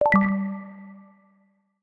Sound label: Twinkle, notifications, Samsung Galaxy J4 Core